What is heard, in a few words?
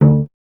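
string cello D, Solo Instruments; Miroslav Vitous; Solo Instruments VOL-1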